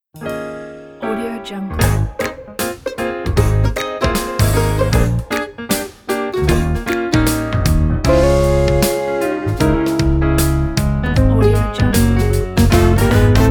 happy good morning

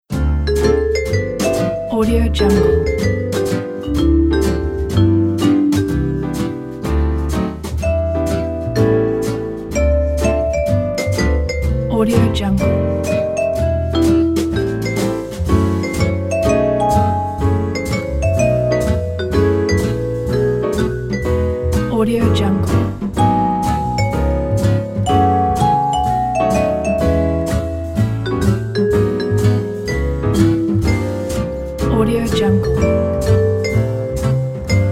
jazz image

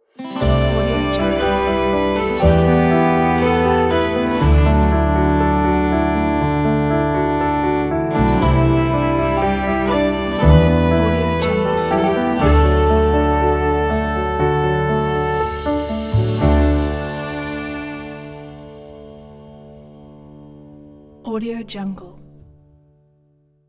celtic romantic ident; andyslatter

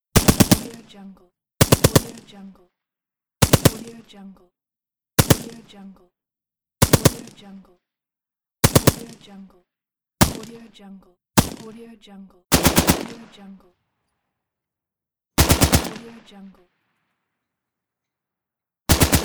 gun locker rifle stg 44

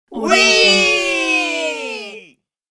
family group wee short

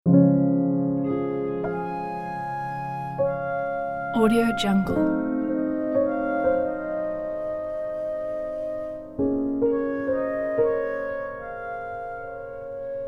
clair de lune piano and flute intro; debussy

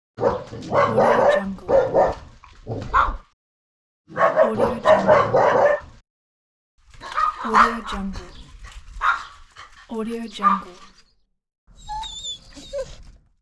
dogs bark

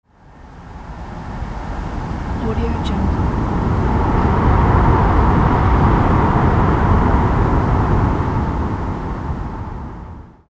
wind blowing; alexm76

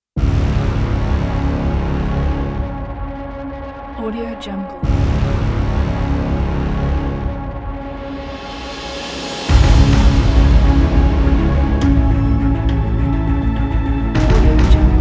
epic trailer

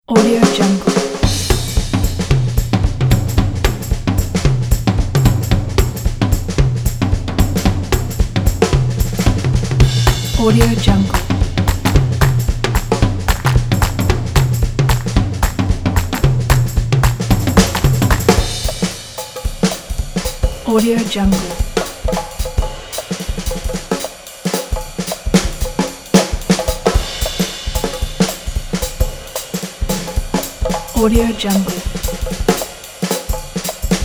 jazz drum vintage solo 50s style; mix-o

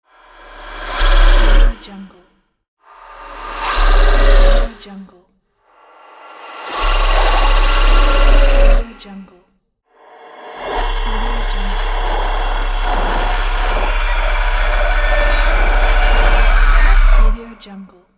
futuristic system machines

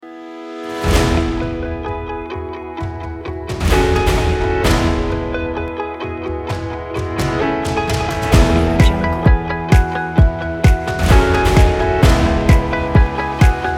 upbeat corporate

